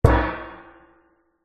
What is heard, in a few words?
impact metal lid trash gong like; zapsplat